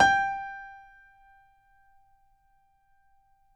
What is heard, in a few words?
G4, Grand Piano; AKAI S6000 CD-ROM - Volume 2; Grand Piano 256M